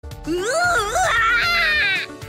weird scream; Saihara

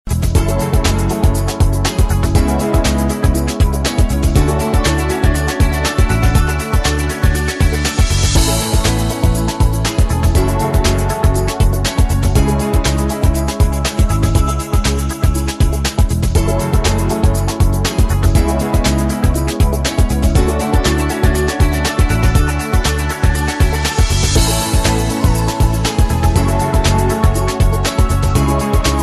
Weather Music

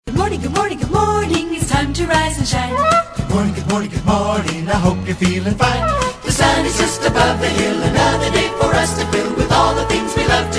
Good morning tune

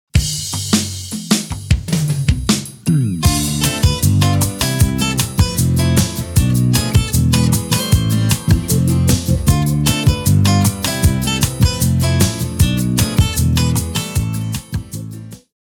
Funk Alternative

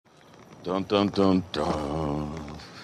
Dun Dun Dun

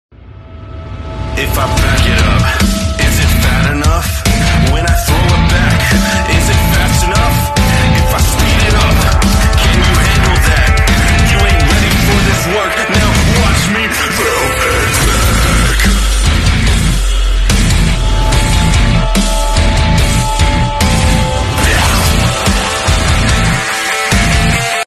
Metal Cover

